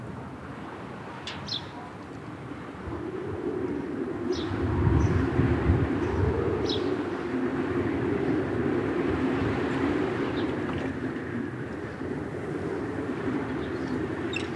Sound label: Western Wind Spectral